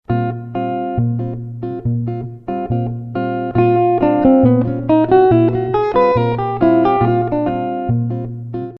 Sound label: B triad, E triad over Bb; In out on 1 chord